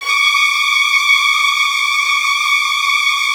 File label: C#3, Strings, STR HLF TRLL; ILIO; Kirk Hunter Virtuoso Strings VOL-3; 90 s Sample CDs